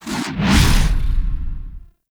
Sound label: Throwable Coolant Item Whoosh; Gameplay; Warframe; Venus Open World